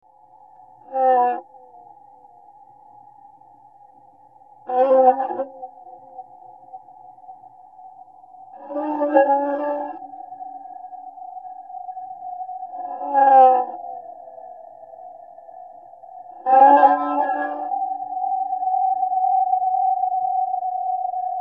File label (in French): harp dyn archet